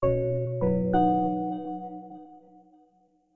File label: Startup